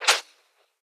Freeze, Guns

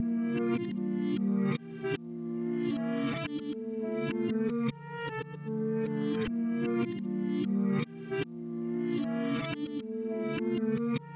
153 BPM D Min Guitar; Melody; Reverse; Loop Stems; Cymatics; 2022 Melody Collection - Reverse; Siege

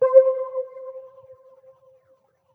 Phased Key One Shot - C

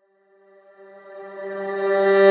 Tonal Reverse - G; House; FX; Sidesweep Tonal Reverse

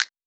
Snap; Cymatics; Eternity Sample Pack; Drum One Shots

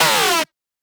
synth screech oneshot G# dry; Synth Hits; GhostHack

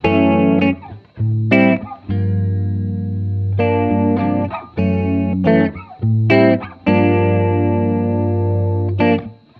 Xmass Guitar Loops 100bpm Cmaj; Guitars; Ghosthack; Advent Calendar 2019

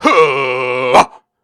Grunts and Chants, Viking Vocal

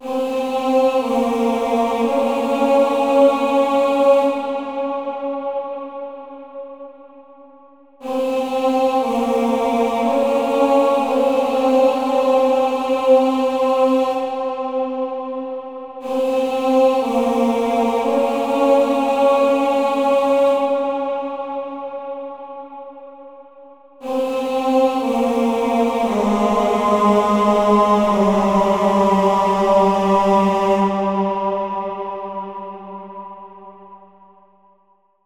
Choir - Abbey Voices - Cm 60Bpm; Instruments; Ghosthack; SkyRealm

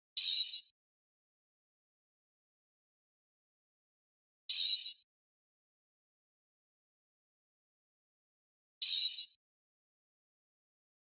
Drum Loop - 111 BPM Open Hat, RnB; Loop Stems; Cymatics; GHOST (Beta Pack); Forever Drum Loop

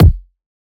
Kick; Cymatics; EDM Starter Pack; Drums - One Shots

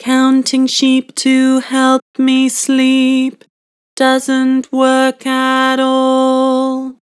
Vibrato, Vocals; Counting sheep; Harmor Vocals

